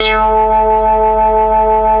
Synthesizer Middle C Notes, Lead

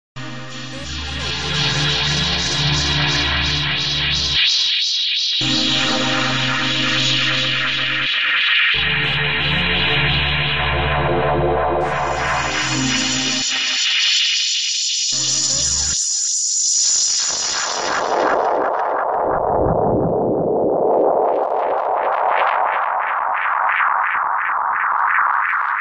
albino delay synth abstractions; SYNTH AND ELECTRONIC

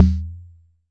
Jomox MBrane, drum machines; Jomox MBrane 11

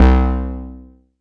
mbrute syn, Arturia MiniBrute

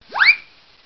Clown Toy Whistle

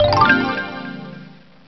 Xylo Pad

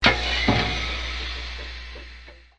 factory impact, Factory Percussion

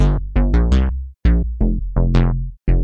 084 uninspired synthbass, BASS LOOPS

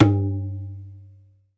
tabla

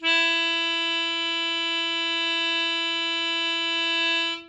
hohner soprano melodica E3

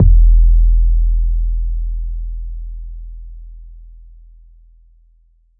trap bass; multisampled; 7rystan Trap Bass

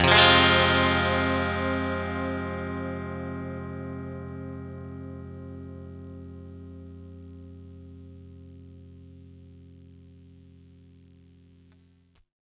clean chord Fm, clean electric guitar chords

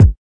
kik

